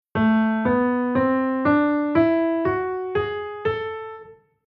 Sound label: Chord mode Melo Min